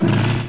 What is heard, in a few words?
Teleport; tenchi muyou - sniper